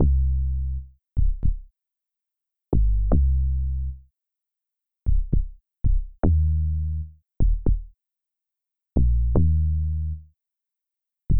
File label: bass